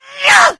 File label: rage at victim, infected female